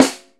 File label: Piccolo Snare f; Digital Sound Factory Virtuoso